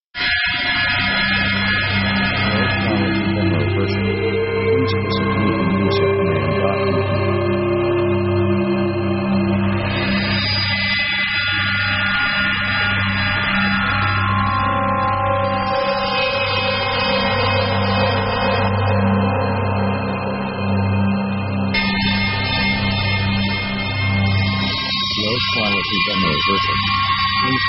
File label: fairy tale diva; synth themes